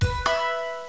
index beep; The Search for Ancient Wisdom

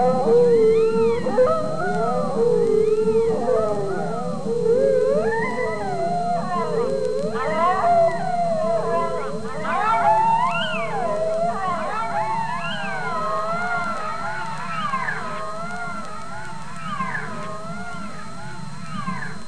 long wolf; HORROR

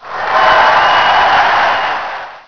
Crowd cheering; FRACAS 1.2.1; Fracas Sounds

